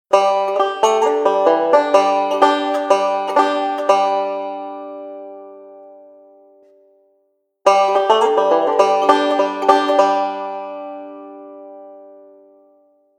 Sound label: Banjo